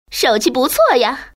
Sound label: sound win small; yule; baccarat